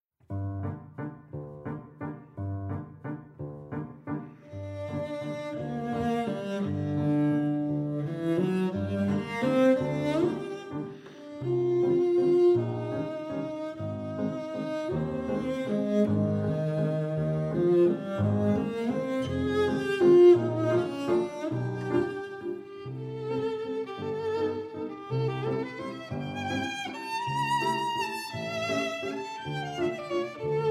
Waltz, PIANO TRIO JAZZ SUITE; SHOSTAKOVICH

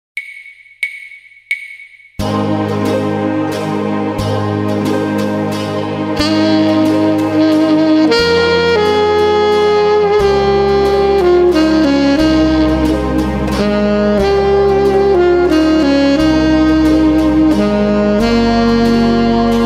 with melody, Basi Musicali

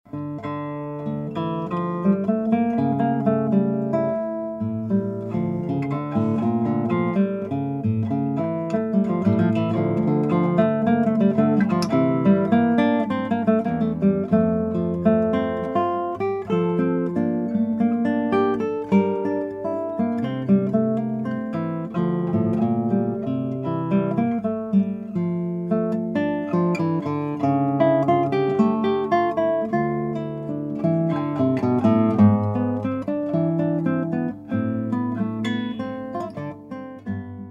Allemanda-Partita n. 2 BWV 1004 for violin; Johann Sebastian Bach ( 1685- 1750)

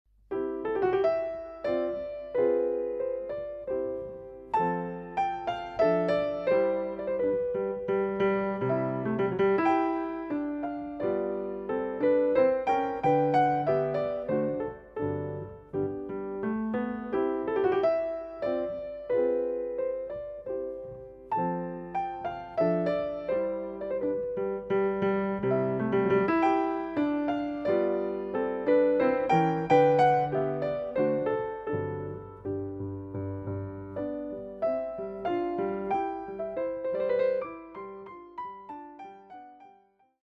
Piano sonata Op.1 n.1 - Andante

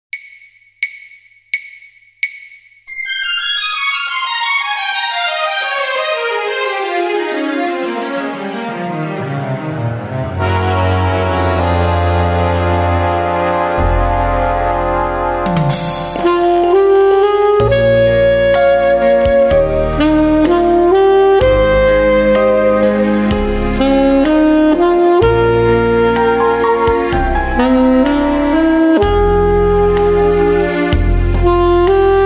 with melody, Basi Musicali

